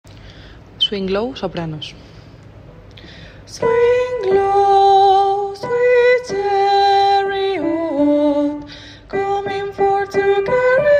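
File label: soprano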